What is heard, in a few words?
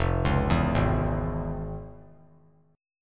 F Minor Major 7th Sharp 5th; arpeggiated; JGuitar